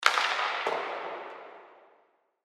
Hockey Puck Hit Impact Side Boards; Hockey (Sound Effects:Sports)

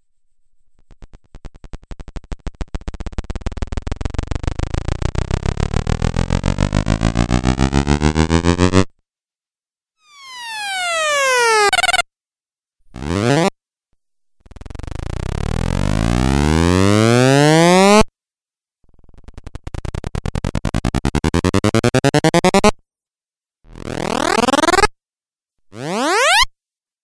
Rising Video Game Soundpack

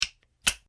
Spring Hook Foley Rock Climbing Clip; Latch; Latches (Sound Effects:Objects)

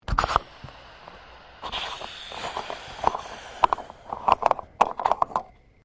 CAR SUBARU LIBERTY EXTERIOR PETROL CAP OPEN; Car Subaru Liberty 20 01; Family members Subaru; Cars (Sound Effects:Vehicles)

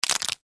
Human Knuckles, Knuckles Crack Fingers; Break Bone; Blastwave

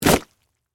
Splash Wet Heavy, Mud; Texture; Splashes; Sound Effects:Objects; BlastWave

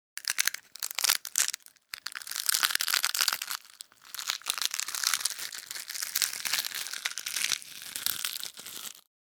Eggshells, Crunching sound of eggshells; Crunches; Objects

